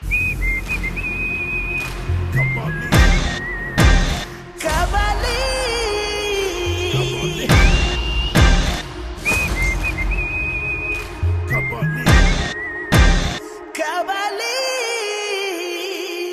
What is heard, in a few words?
Whistle Tone; Kabali